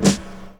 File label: SD, HIP, Dance Mega Drums